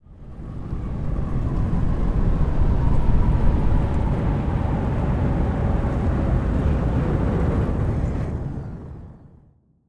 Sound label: Skybox Ship Thrusters Exit Seq